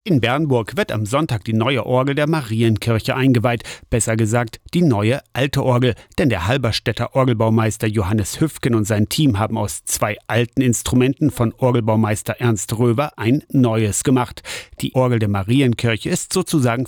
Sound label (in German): orgel; Eine neue Orgel für die Marienkirche Bernburg